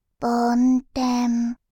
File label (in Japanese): 梵天, ASMR, バイノーラル右